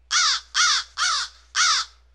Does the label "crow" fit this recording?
Yes